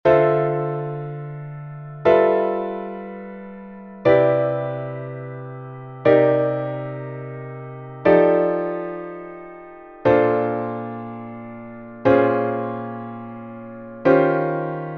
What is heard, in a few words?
Jazz Chords II-V-I Descending Steps